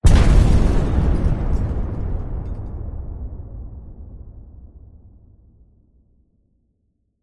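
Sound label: with an explosion of flame and glass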